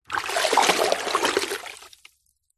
household plastic bucket water shallow movements swirl; zapsplat